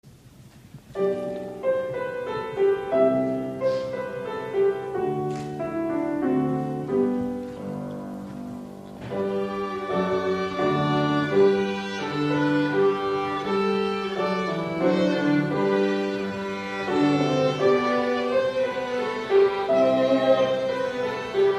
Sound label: Two Violins